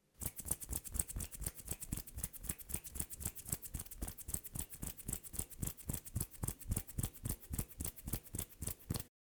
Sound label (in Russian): zvuk palcev